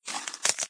Water Filling

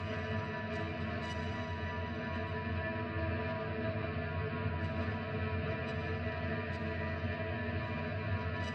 Post Piano Drone; Devour; The Town; Intro Sequence

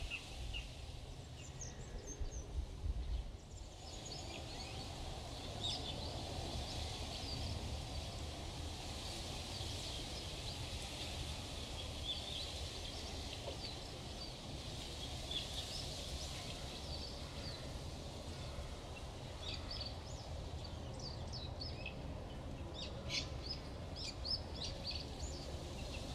Relaxing Sounds; Picross S4

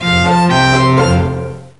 Gothic Win; Mystery Tales: Time Travel; NDS

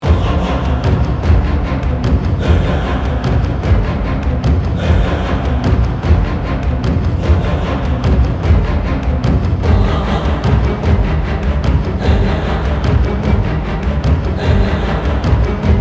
Amb End Intense; Amnesia: The Dark Descent

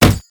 Click

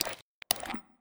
Leech Maw Idle; A Robot Named Fight

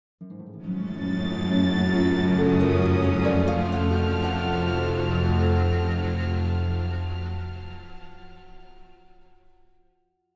Stinger Mystery; Dishonored

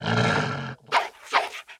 Warbeast Wound; Puzzle Chronicles

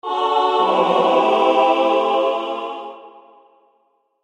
Ooh; ultra custom night campaign mode windows gamerip 2022

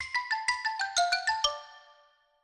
licks

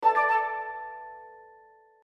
Ingredient Awake; my cute commissar 2020 gamerip

